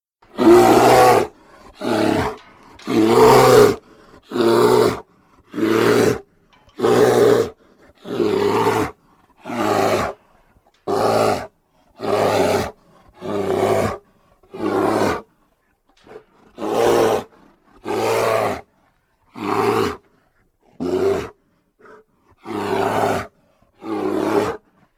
grizzly

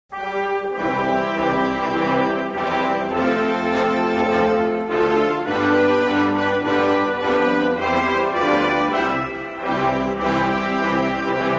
anthem

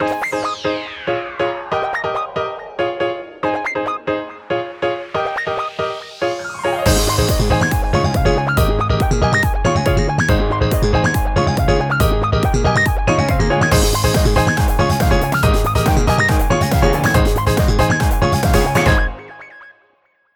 Jazz

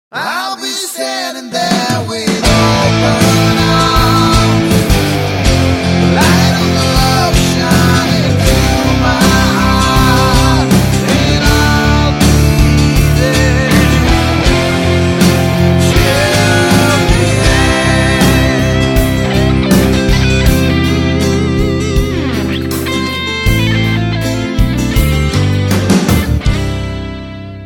telefona micromax

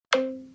namedrop complete; Системные звуки и уведомления iOS 18 (iPhone 16, 16pro, iPad)

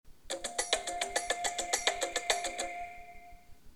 nimblebeat, zvuki telefona blackberry bold 9700

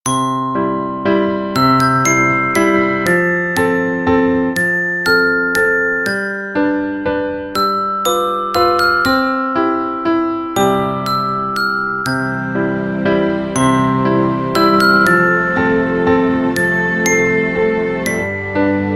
waltz alarm; telefona infinix

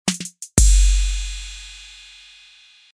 ba dum tss

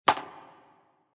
badminton

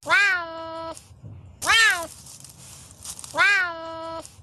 serval

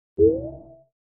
full battery; oneplus

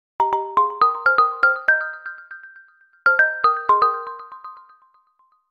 chilled, huawei harmonyos

